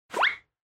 Motion Agility High

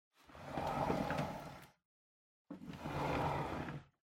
Vacuum Cleaner Body Move

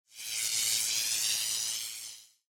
Electric Shock, Long

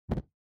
Lighter Flame

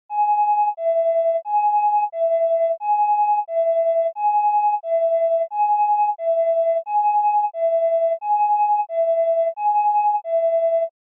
Ambulance Siren (Dry)

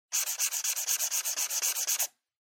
Felt Tip Pen (Fill)

